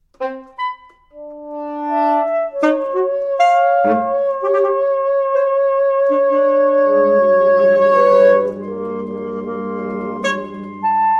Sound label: Quartet